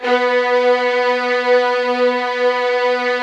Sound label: LD VLN B3, LOUD VIOLINS; Q Up Arts; Denny Jaeger Master Violin Library